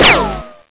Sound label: cement, debris